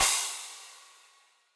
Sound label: Crash FX Deep Snare Dynamics, Cymbal; Drums; Drum Hits; Standard Hits; Ableton; Factory Packs; Session Drums Club